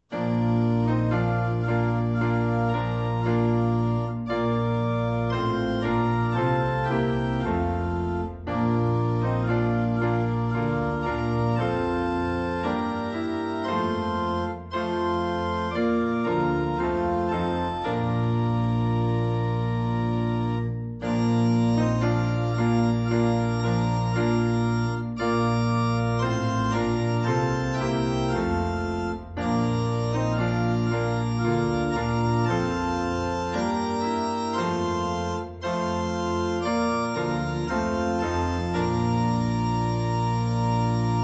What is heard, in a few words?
SDA HYMNAL PRAISE AND WORSHIP SONGS INSTRUMENTAL TUNE